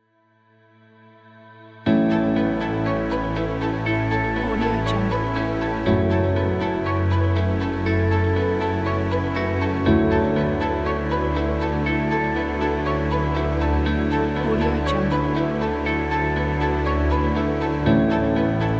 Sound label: motivational classic